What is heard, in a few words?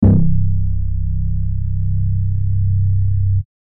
Bass One Shot